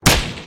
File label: Gunshot smg